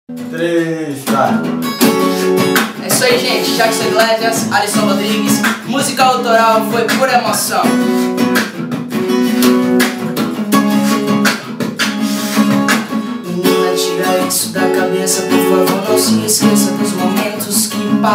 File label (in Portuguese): musica autoral